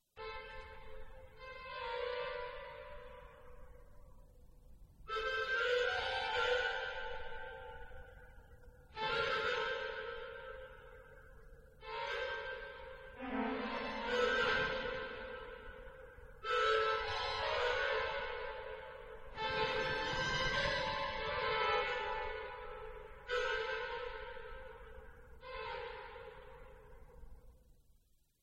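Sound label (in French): impro, orgue; Improvisation n°2; Haikai; Organiste: Odile JUTTEN; prise de son: Jean-Marie LETILLOIS; epernay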